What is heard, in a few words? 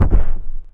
Body Fall; Civ4 warlords